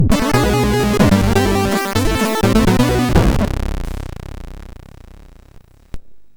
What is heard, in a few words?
Incidental sid MOS6581R4; Street Fighter II; hvsc